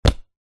ball small catch hands

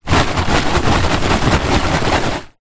popcorn paper bag shake open full

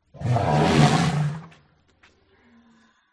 Elephant; audio hero

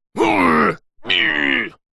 human male fight vocalisation karate; zapsplat

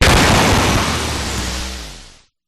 Glitch Decimated; Glitch Factory 01; sound spark

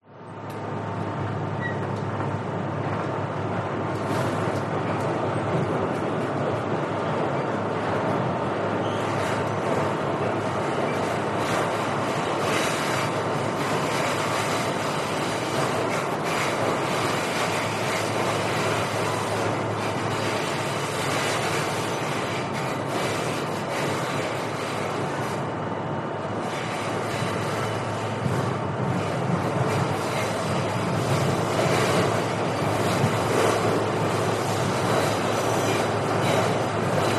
New York City Subway Ambience

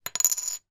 foley chip token plastic down on table

